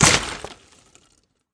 Water Shake; UNUSUAL KITS and FX; ModeAudio

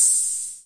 wersi hho, Wersimat 24; drum machines

